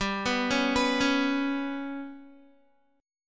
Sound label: G Major Flat 5th Guitar, chord; Listen to GMb5 arpeggiated